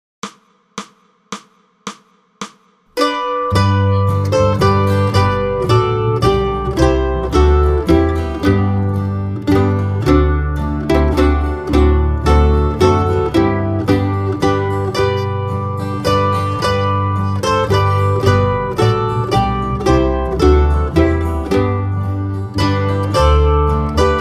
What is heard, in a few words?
Bass All; christmas; Away in a Manger